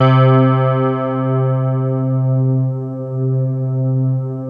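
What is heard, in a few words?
keyboard PAD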